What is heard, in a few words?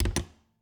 Refridgerator, old fashioned, wooden, steel, brass clasps, hinges, close; Eiravaein Works; Vaeyan IV